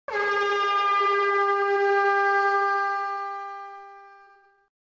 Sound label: showtime buzzzer